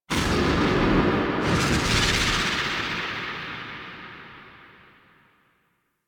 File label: Power; Ash; Warframe; Vitruvian Museum; Warframe Powers; Leverian